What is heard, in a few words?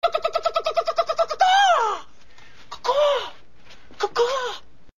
CaCaw